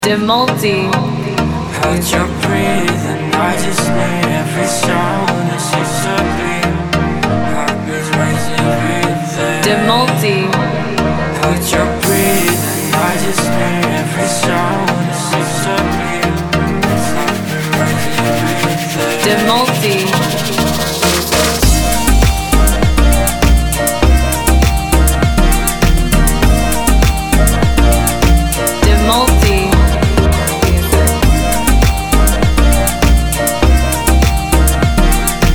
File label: edm